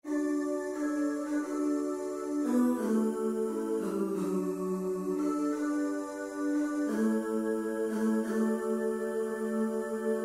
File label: Joy to the World TENOR; HAENDEL